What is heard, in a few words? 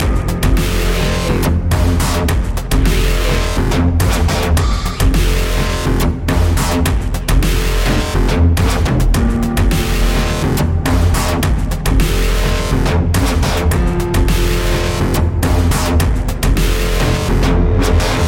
distorted reality loop